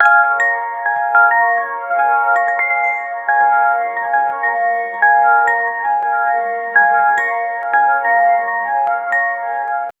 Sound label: make it melodic, melodic